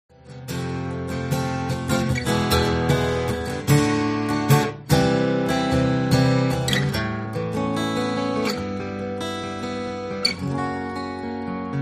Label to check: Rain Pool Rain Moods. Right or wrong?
Wrong